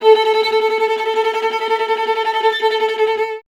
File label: viola A; Miroslav Vitous; Solo Instruments VOL-1